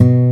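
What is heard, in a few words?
B 1 OVATION, acoustic guitar; AKAI S6000 CD-ROM - Volume 1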